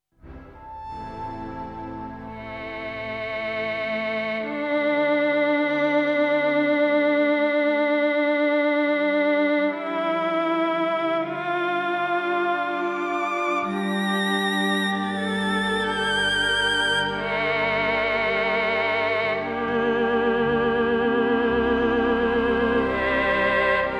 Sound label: Violin Concert